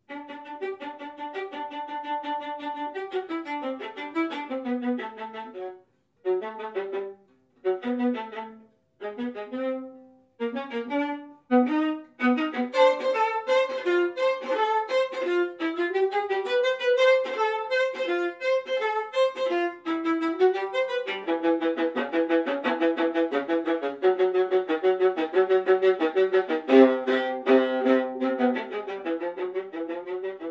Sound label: Viola; Brahms